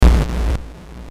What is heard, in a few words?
Explosion